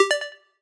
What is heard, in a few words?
Correct Selection Sound